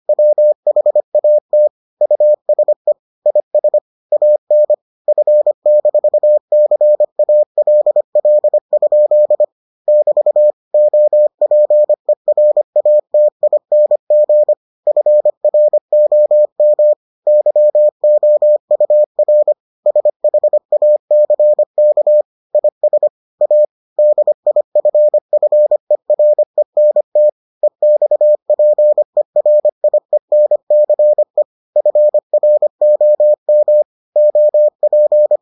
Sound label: morse